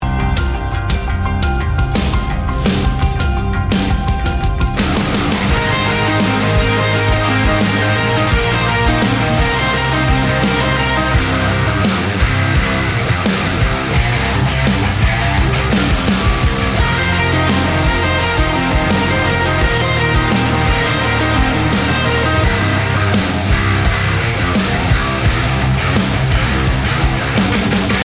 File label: Instrumental